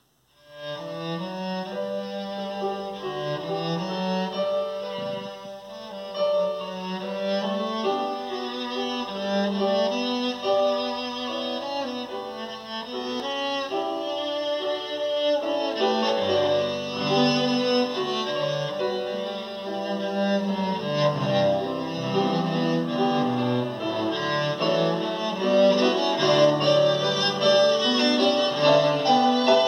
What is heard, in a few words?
Air 4 cello